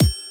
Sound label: Triangle, Drums; BB 2HTC Samples Vol 4